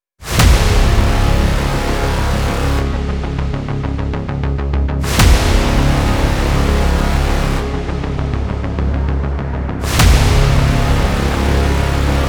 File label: Danger, tense